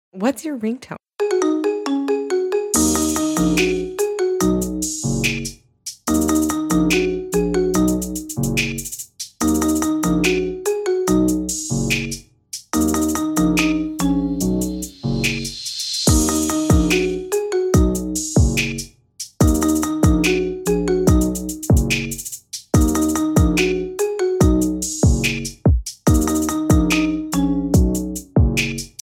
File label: marimba remix ringtone